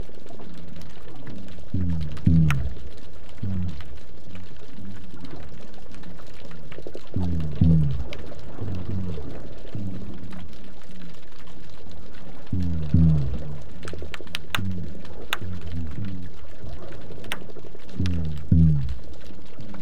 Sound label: drumming amplified LPfilter500 black drum